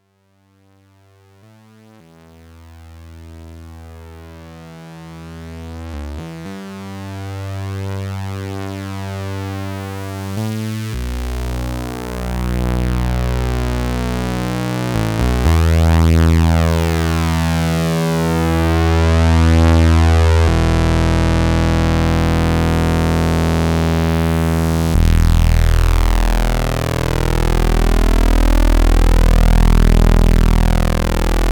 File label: A8 notch filter